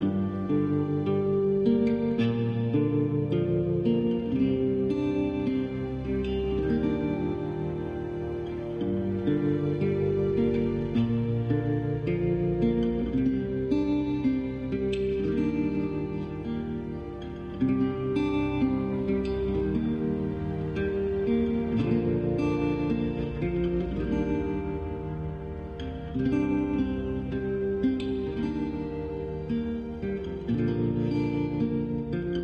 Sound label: Loop Neutral